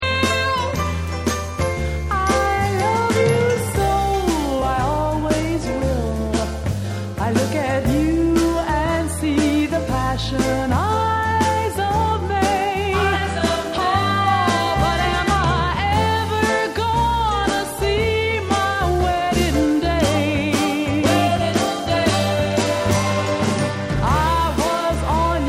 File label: Blues